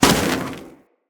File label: Fire Arrow Impact; Level Events; Midrath; Warsong Prologue